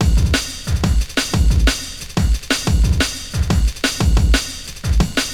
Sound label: Dope Break, Drum & Bass; Fluid Dynamics